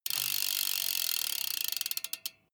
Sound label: bmx bike freewheel push and slow down stop